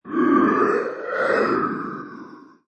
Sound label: Ghostly Moan Whoosh, Eerie, strangely twisted, ghostly moan from a monster; Ghost Sound Effects; Eerie, strangely twisted, ghostly moan from a monster, creature or ghost; Horror; LynneMusic